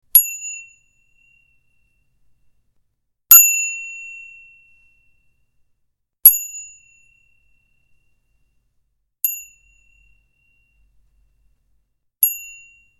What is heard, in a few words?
Five Dings